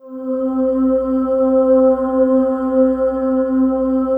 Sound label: PAD, Drum & Bass, 160 BPM; Ueberschall; Drum & Bass Flash Trackz